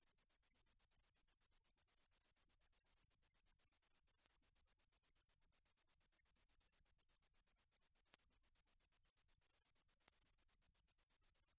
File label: animals insect cricket; sound effects one